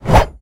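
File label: energetic sharp sword swing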